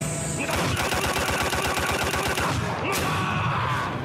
kicks

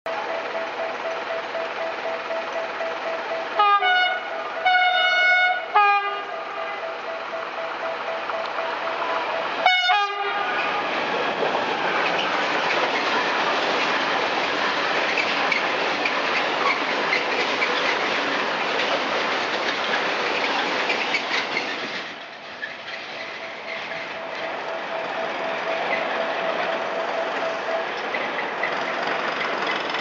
Bombardier Renfe 253

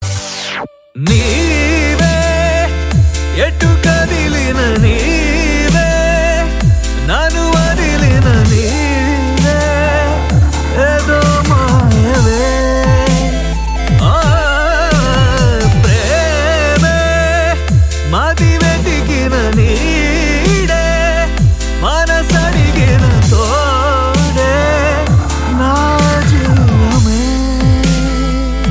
Telugu

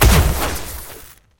lightning

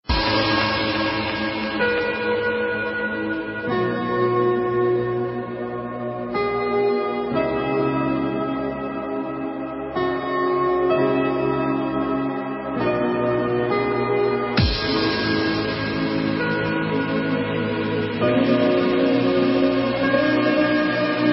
Piano Trance

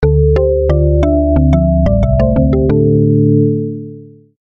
notification

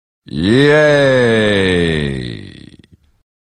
Yaaaay

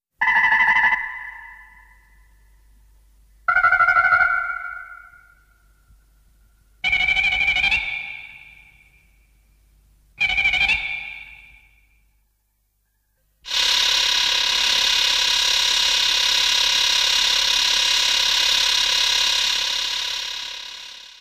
roar; King Ghidorah

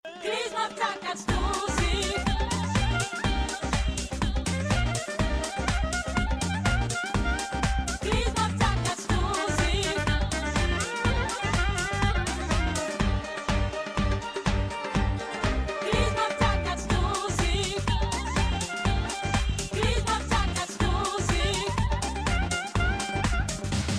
Armenian ring

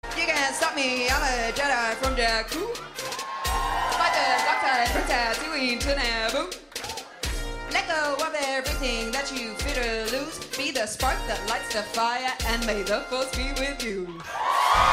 Raps Ringtone

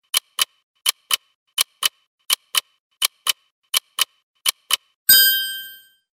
Tick Tick

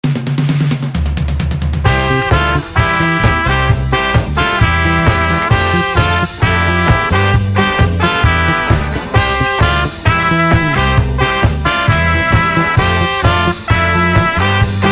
Disko

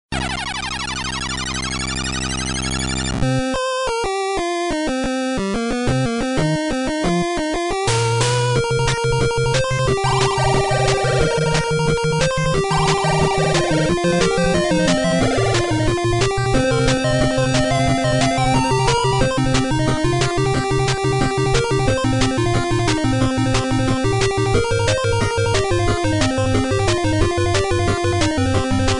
8-bit

